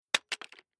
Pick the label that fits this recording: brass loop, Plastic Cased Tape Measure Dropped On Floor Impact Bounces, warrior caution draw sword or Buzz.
Plastic Cased Tape Measure Dropped On Floor Impact Bounces